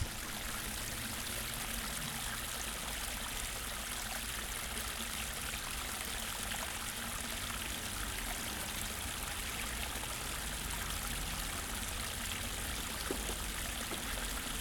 Small Waterfall Far

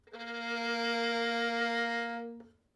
Violin A 4 Tenuto Vibrato

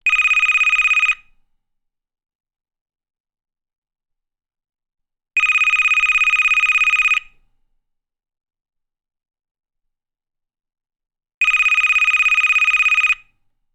Phone Cordless Ring Beeps

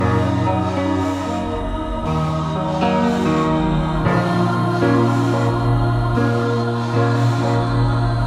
Piano Loop Vocal Drums

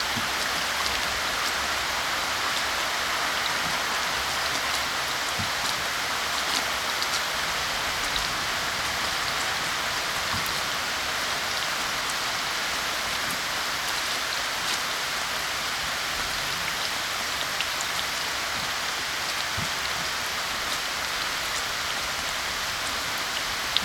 Rain

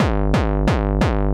Acidcore

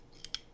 Kitchen

